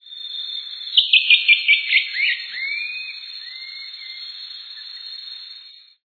arapacu-de-spix

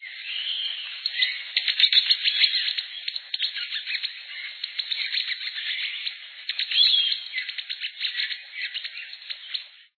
balanca rabo de bico torto

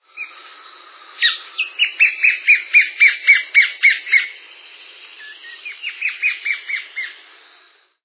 choquinha de flanco branco

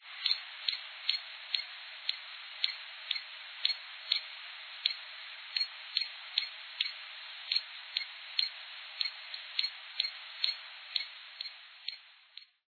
beija flor de topete

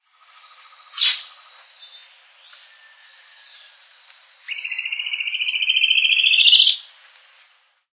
chupa-dente-de-capuz

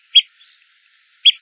pernilongo

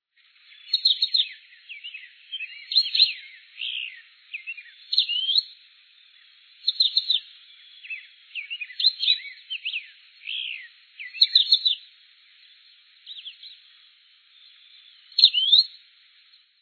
mineirinho

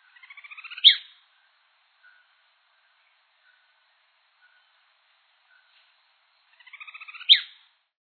vissia

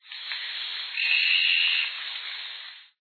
maria-sebinha

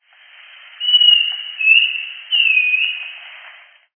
flautim-marrom

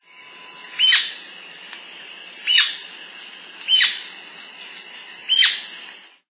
maria leque

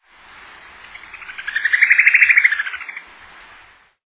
bico-virado-da-caatinga

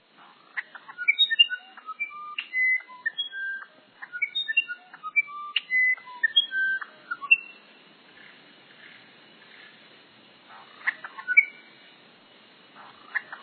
uirapuru-verdadeiro

